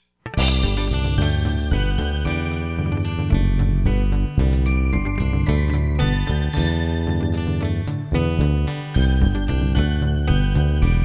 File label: No Sax Guitars Included